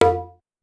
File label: Conga Lo, Pitched Up; Clavia DDrum-AT